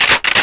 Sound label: shotgun pump